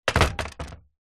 vehicles motorcycle helmet set down on wood table hard visor vibrate